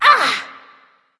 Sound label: grunt hit; smite; neith